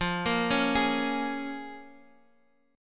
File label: Bb Minor 7th F